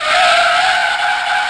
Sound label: skid normal; io tracks circuit; darkvalewest